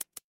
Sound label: office pen